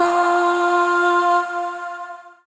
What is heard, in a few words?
Void Shawzin Oct Two F; Warframe; Zariman